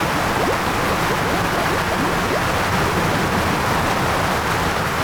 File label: volcano